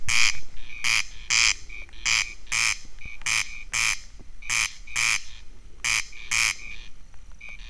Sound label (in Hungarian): egy közelről kettő távolról, haris; Teresztenye